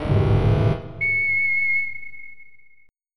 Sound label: SNORE